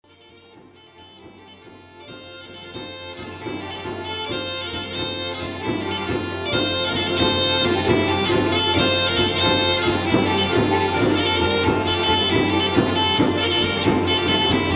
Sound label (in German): Troubadour Zwiefacher